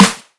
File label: studio snare sample g key, Royality free snare drum sample tuned to the G note.